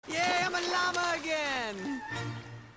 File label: Llama